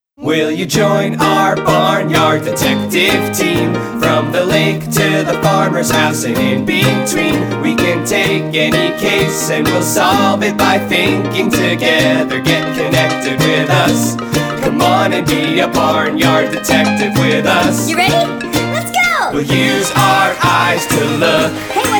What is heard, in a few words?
Chickie Rock